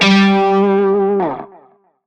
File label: Shawzin Flourish Oct Two G; Music; Warframe; Narmer Shawzin